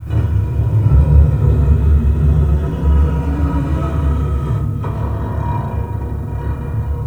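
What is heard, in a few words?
MEGA SCRATCH; FX; InVision Interactive; The Piano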